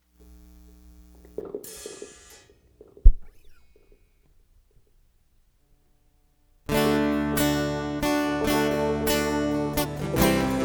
Instrumental

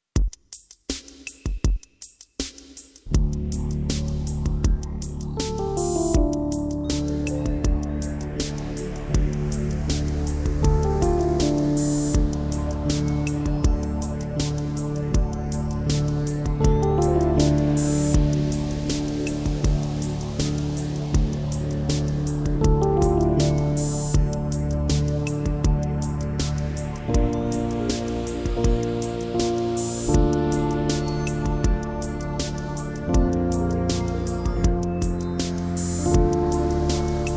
Instrumental

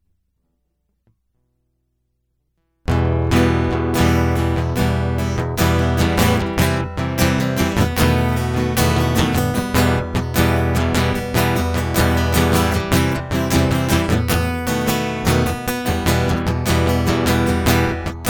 Guitar Loop; God Rest Ye Merry Gentlemen

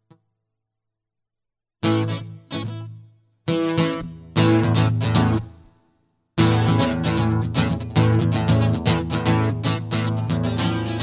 Wide Eyes Instrumental

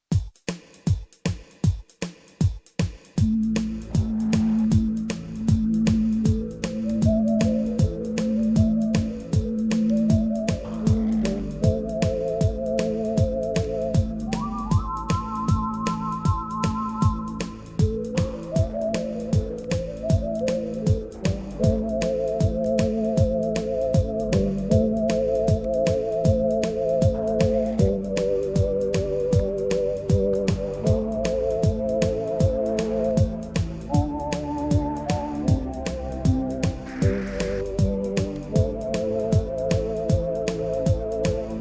Instrumental

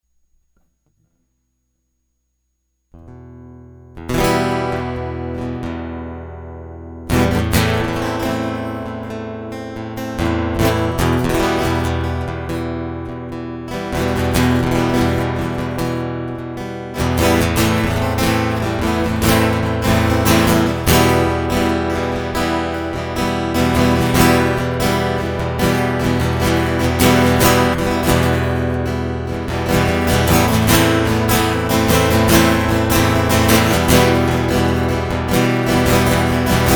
Id Love to Stop This War Guitar Loop

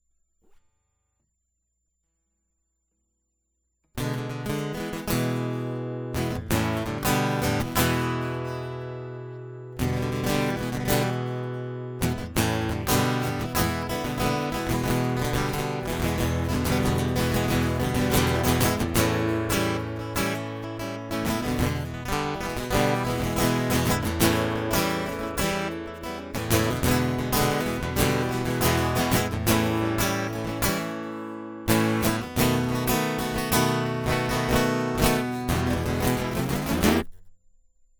Guitar Loop

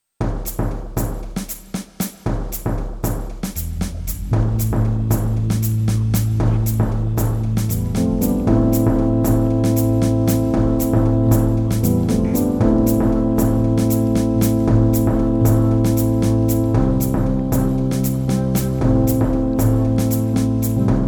Instrumental

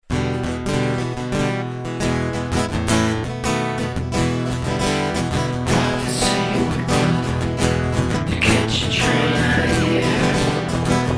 Blues; Kyiv Blues Part II

